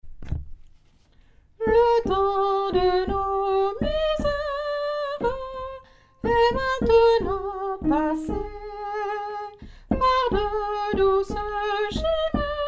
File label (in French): saltimbanques tenor; chorale